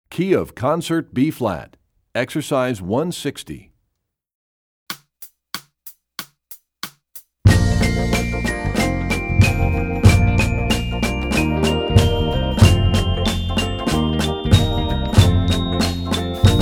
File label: Studies Concert Bb, Percussion; Rubank; EE2000 Book2